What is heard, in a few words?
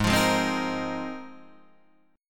G sharp 6th Add 9th, Guitar, Open D